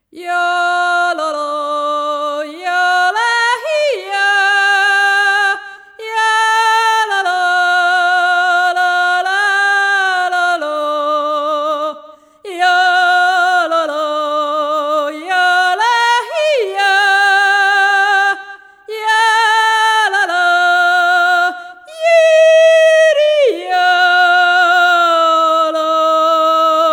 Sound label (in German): Jodel